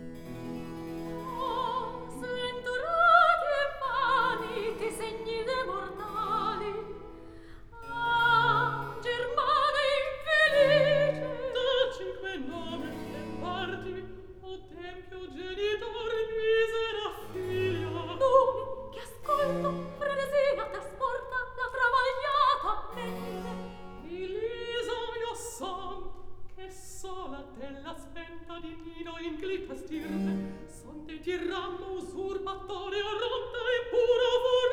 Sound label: Recitativo; Act Three, Scene 2; O sventurati e vani; Floridante